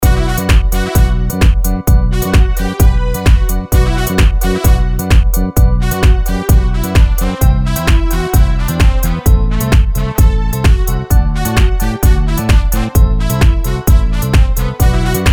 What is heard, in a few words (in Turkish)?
ARANJE TR POP